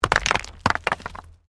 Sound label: STONES MOVE